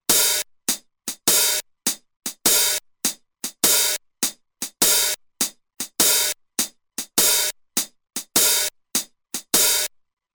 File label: 4 Beat, Roland TR-505; Factory Rhythms